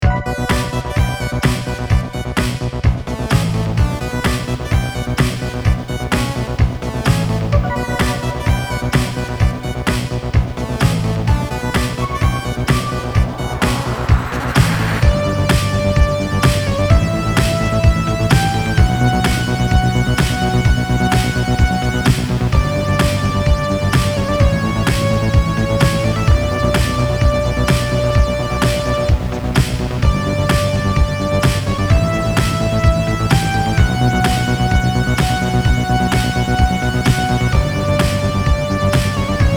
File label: synth driver